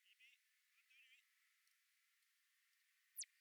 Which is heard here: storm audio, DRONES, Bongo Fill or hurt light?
DRONES